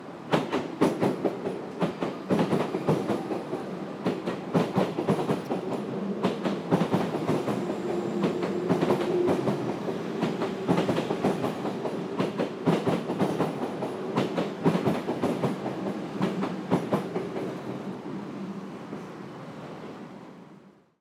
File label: Train, city, Paris; lilesound